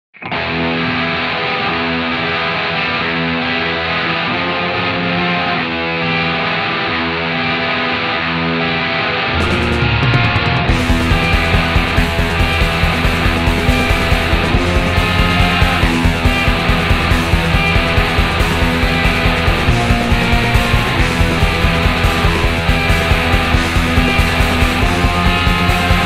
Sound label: blk metal sample